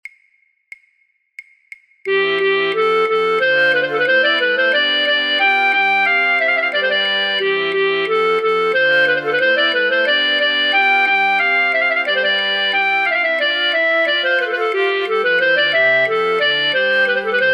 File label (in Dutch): Branle flute choir voor oefentrack fluit; Rameau; Platee